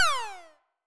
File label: shoot